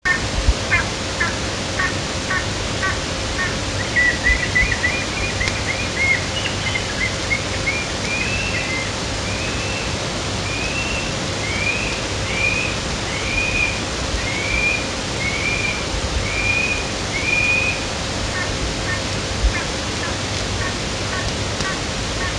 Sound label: Mysterious Bird Call